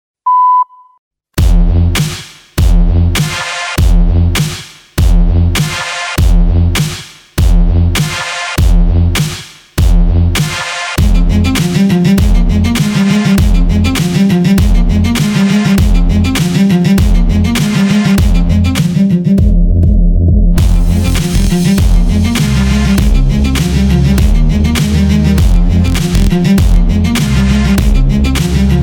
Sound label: energy electro